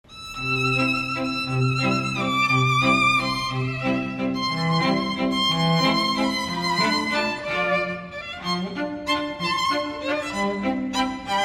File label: waltz